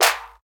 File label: hand clap sample E key; single hits one shots